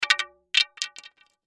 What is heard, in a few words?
Impact Wood Rod Dowel Stick Drop On Cement Fall Bounce; Blastwave